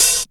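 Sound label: HH open hi-hat, Fresh Disco House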